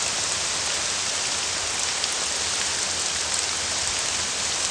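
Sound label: rain heavy; The Elder Scrolls III - Morrowind; Morrowind GOTY GOG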